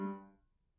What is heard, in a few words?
Grand Piano Main Release G1, Kawai; Chordophones; Releases; Legacy